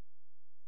C#, Synclav FM, FX; Big Fish Audio

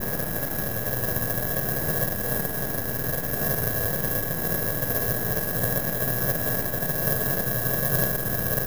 Drone Ambient; Drums & Drones

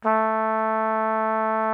trumpet A2; Greytsounds VOL-1

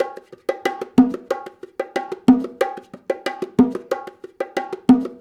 Call And Response Bongos, 70s Analog Funk; Sonic Foundry (Sony Creative Software); What it Is!

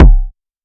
CLASS ACTION LAWSUIT KICK

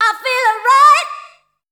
female dance vocals; Best Service ProSamples vol.12; AKAI

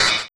Zappy Fx; Sonic Foundry (Sony Creative Software); Esoterik Beatz

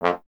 Trombone F; Miroslav Vitous - Solo Instruments VOL-1

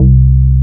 FAME C2, BASSES

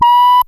COMPUTA TONE; Zero-G Ambient 1; COMPUTACHATA

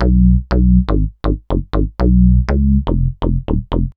Multifunk bass, Synth Bass Loops; Sonic Foundry (Sony Creative Software); Groove Elements

